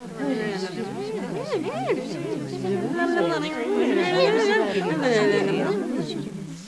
Crowd; Princess and the Pea; SoftKey

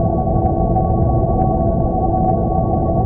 engine; Earth and Beyond; EA Games

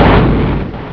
Lose; Kinga 2.0

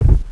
bulf Walk; NETSTORM

